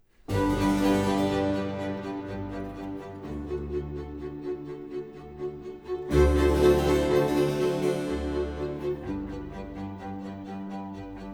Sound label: Concero for Violin in G major, Larghetto; RV 312; Vivaldi - Concerti per violino III 'Il ballo' (2009)